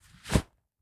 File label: Pokes Into The Sleeve, Clothes